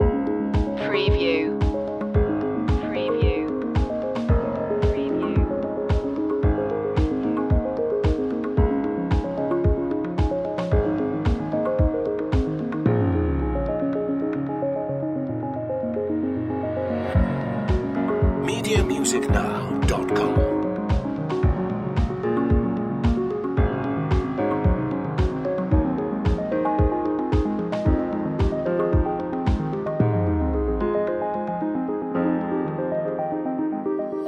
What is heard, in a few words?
clear loop